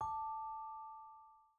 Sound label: In Call Notification